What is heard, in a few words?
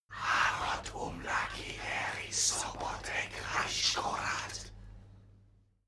male crowd evoke whisper